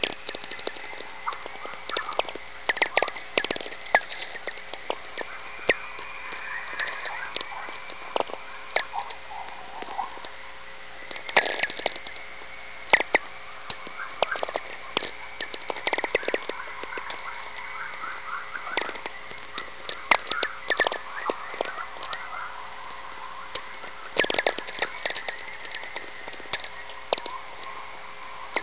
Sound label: VLF auroral chorus, Alberta